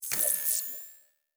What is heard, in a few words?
Device Start, Electric